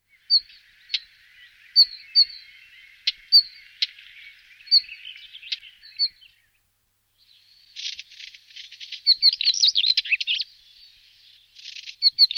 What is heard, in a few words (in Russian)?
Golosa ptits Obyknovennaya kamenka Oenanthe oenanthe